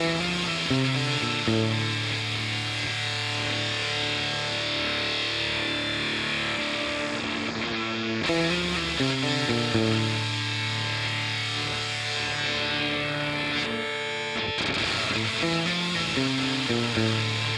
Ultrawave SA 250 Preset with Morph without Tremolo; Source Audio